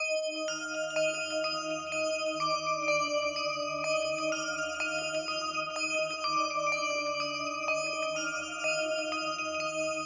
Rises BPM125 Pluck

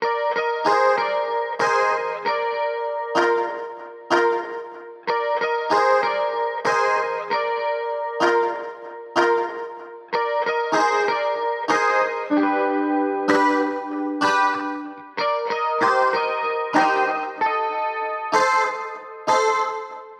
95 guitar softtouch Cmin

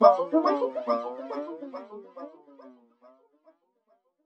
fx lil effect from my voice

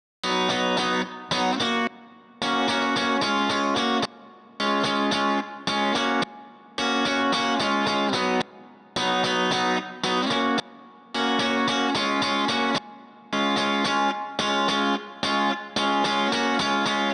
Rhythm guitar chords F- 110bpm

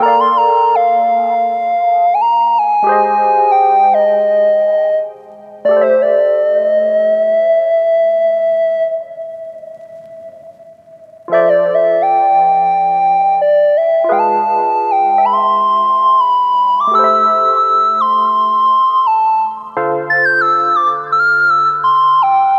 85 melodic stack Bbmaj; quill

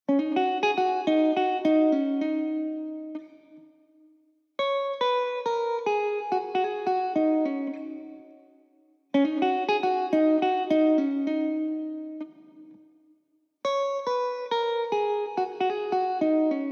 106bpm Guitar Eb m

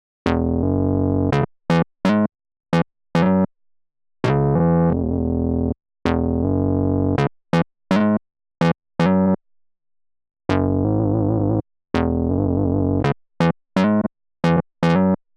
Hot Moog

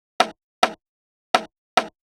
Percussion Loop, 105

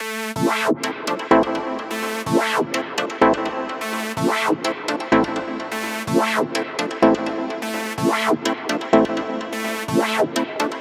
Impact 126 A

